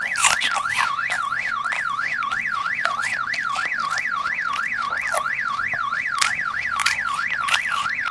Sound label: weaving; kill the virus